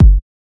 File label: Kickbomb